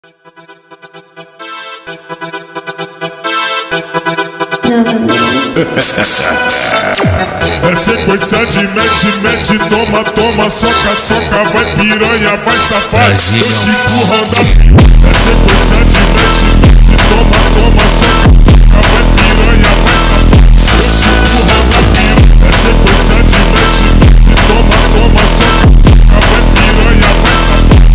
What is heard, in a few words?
Phonk Acordeão Funk